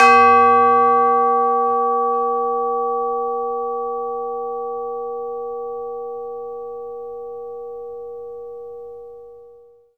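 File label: CHIME A4; ILIO; Synclavier Percussion 2; World & Orchestral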